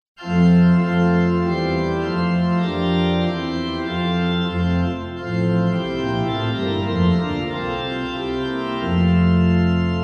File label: organ, Hymn; Come pure hearts in joyful measure; Alles Ist An Gottes Segen